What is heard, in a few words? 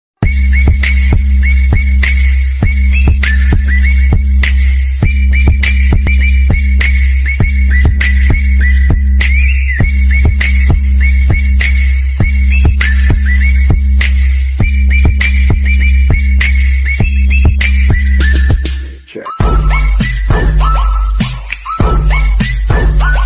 jingle bell jingle bell ringtone